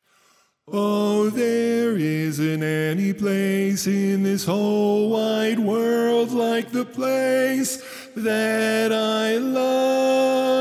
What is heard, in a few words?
Bari, quartet